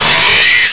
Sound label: alien alert; xenomorphs